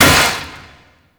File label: Shield Crack; Vehicles; Abilities; Thano Mech; Warframe; Nechro Mech